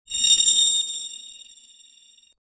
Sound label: fast ringing, bell